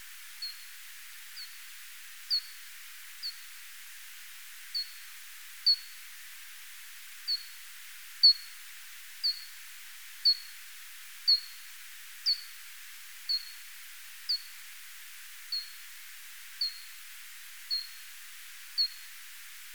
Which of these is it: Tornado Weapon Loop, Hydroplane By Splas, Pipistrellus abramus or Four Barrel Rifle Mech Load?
Pipistrellus abramus